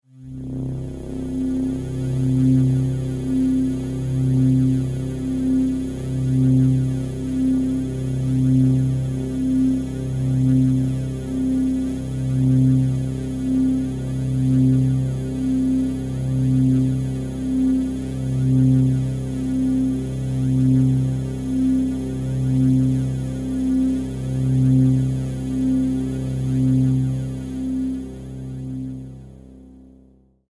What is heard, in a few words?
Spaceship, Spaceship: Ambience; Spacecrafts; Stuart Duffield